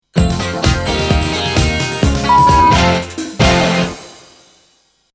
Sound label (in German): Sound Logo mit Sound Bett 2 Toene rockig; Soundlogos für Ihr Corporate Design